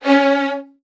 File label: C#1, Strings, VN 16TH; ILIO; Kirk Hunter